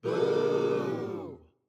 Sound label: audience boo